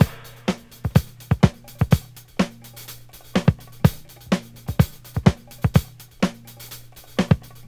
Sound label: 125 bpm high quality breakbeat d key